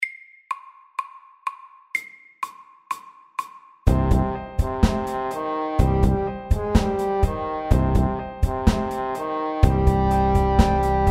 A minor rap MEDIUM 125

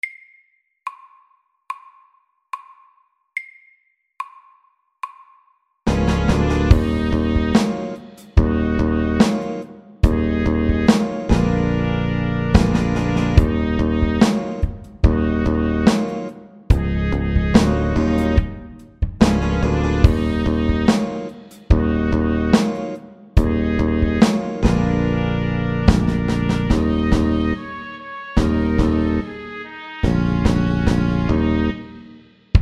Funky SLOW 72; Funky monkey

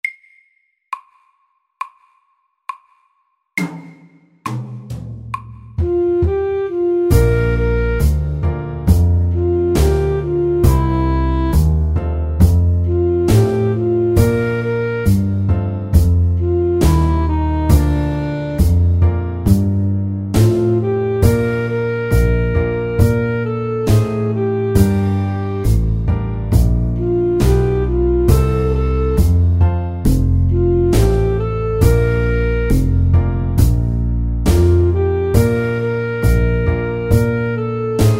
Slow blues middels

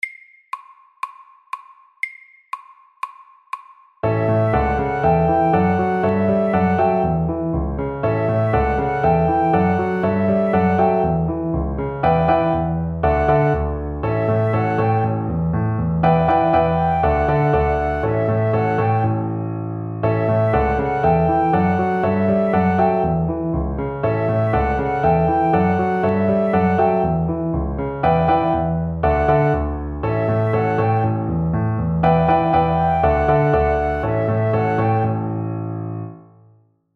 Honky tonk SAKTE

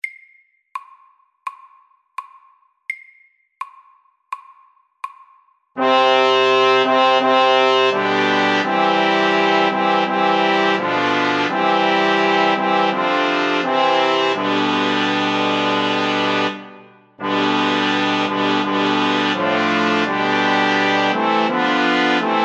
Fanfare for tre SLOW 84

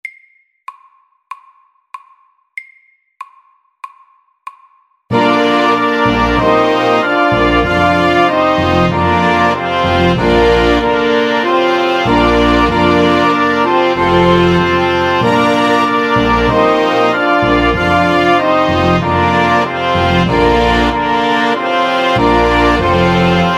MEDIUM; Ode til gleden

